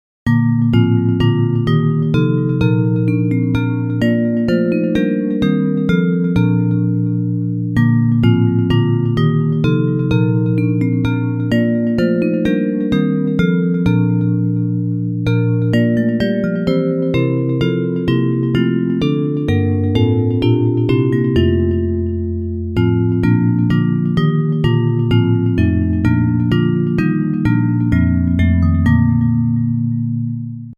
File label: Bells; Hymns